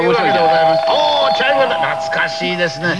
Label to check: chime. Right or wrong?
Right